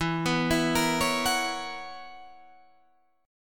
B Major 7th Suspended 2nd Suspended 4th E, chord shape